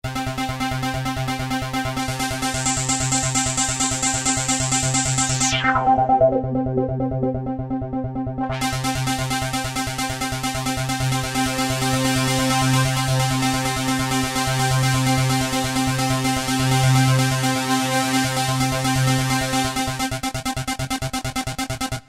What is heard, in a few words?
arpeggio gate, yamaha cs1x cs2x